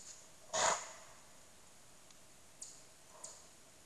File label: moose cow bark